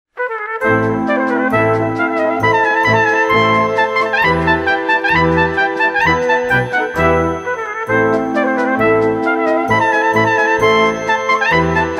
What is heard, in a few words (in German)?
Tuerkischer Marsch